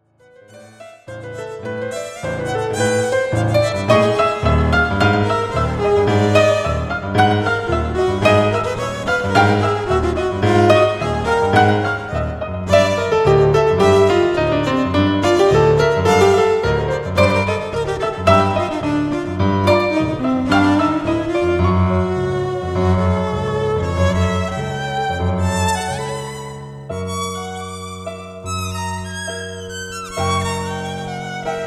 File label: tango nuevo